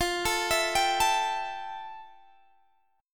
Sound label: F Major 9th F, Guitar, Open G